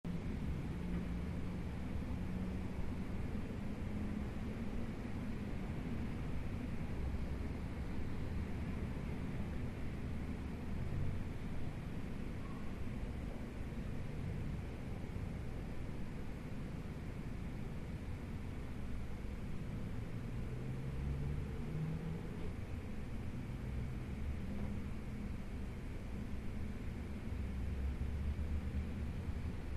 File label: Office Room Light Tra, traffic, distant